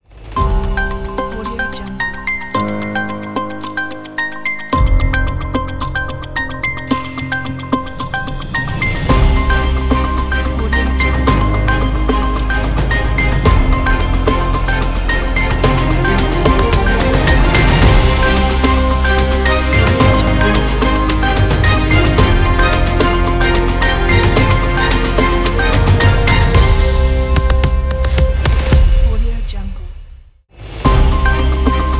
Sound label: news opener intro